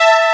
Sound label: SAW,sound canvas